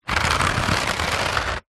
office pencils in plastic rack hand roll over

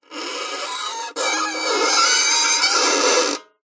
violin badly played screeching bowed

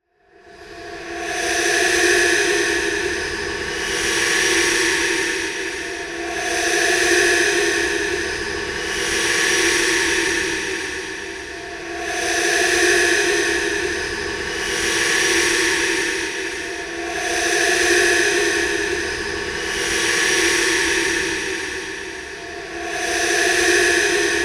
sound design etheral breathing fantasy spooky

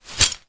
foley glass broken in plastic bag single shake; zapsplat

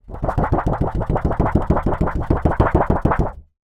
foley metal sheet thin wobble; zapsplat

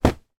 household pillow drop on floor; sound effects one

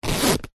bag rucksack fabric empty zipper; zapsplat

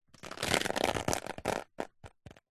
water drips short sudden onto umbrella; nature

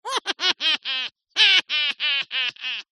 cartoon laugh high pitched

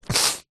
human sniff wet ill

